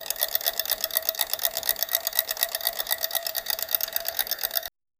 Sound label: spinning clock like a dia